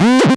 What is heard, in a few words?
Synth Sound; Vengeance Samples; VEE Synth Shots